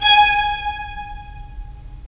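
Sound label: strings violins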